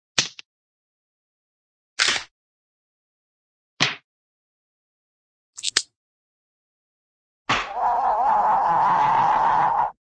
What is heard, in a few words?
snap, wood small branch break; cartoon